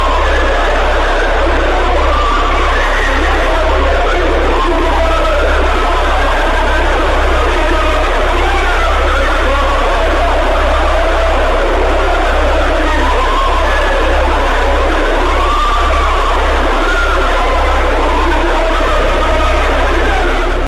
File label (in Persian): صدای شنیده شده از اعماق گودال دروازه جهنم